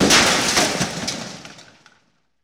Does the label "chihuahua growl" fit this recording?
No